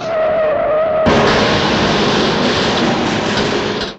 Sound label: screech crash, Vehicles